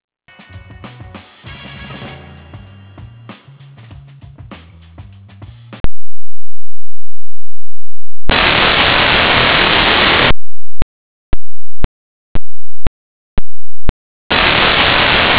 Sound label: clear channel weird ringback